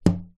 household mirror finger tap knock

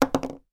foley bottle cork place down on table

